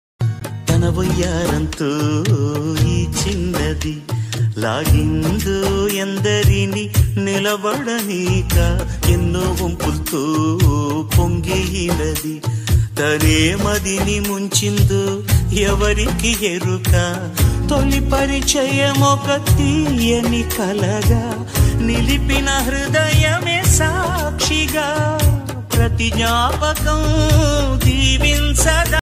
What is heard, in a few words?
movie song, love song; aadavari matalaku arthale verule; tollywood